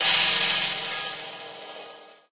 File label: lightbridge elec, footsteps